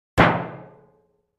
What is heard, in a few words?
Metal 50 Gallon Drum, barrels buckets & cans